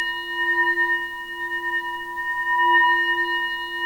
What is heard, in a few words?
BUD BELL; 3D Sound; 3D Keys VOL-1